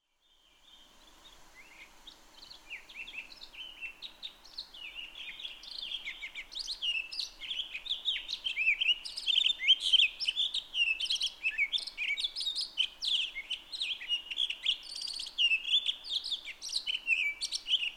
Townsend's Solitaire

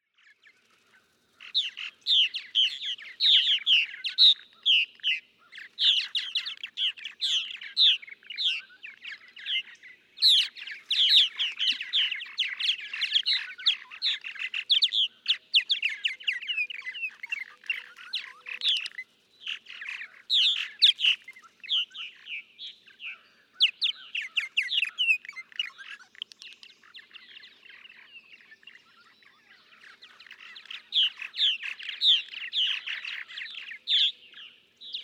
Purple Martin

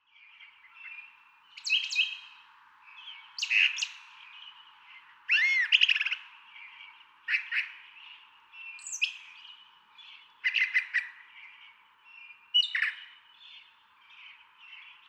Brown Thrasher

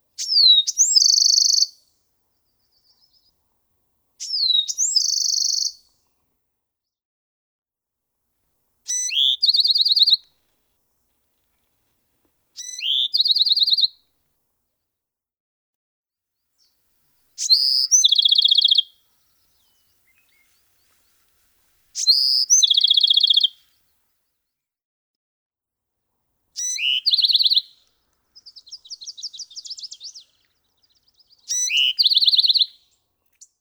Bewick's Wren

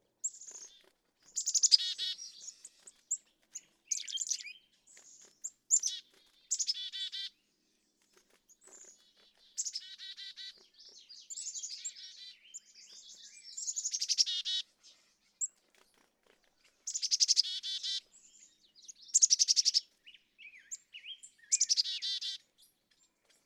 Black-capped Chickadee

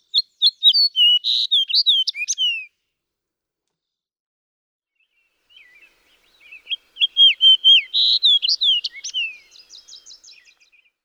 Fox Sparrow

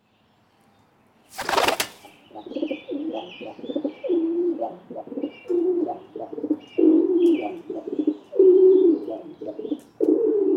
Rock Pigeon

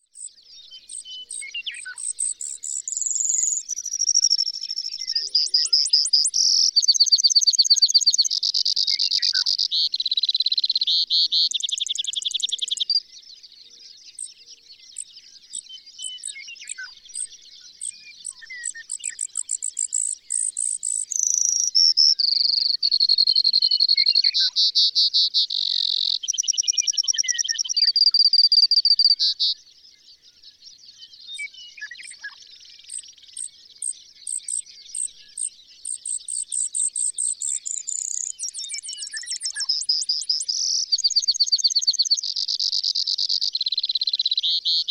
Brewer's Sparrow

